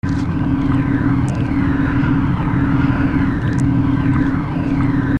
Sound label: Powerup Ambience; Kill Pill; Windows